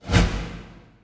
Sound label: whoosh